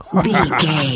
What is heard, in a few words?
voice fun man character deep laugh